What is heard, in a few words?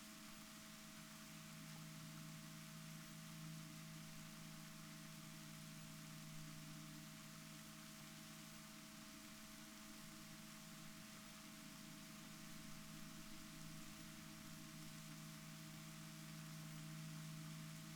rorquals negatif, Balaenoptera Physalus Chile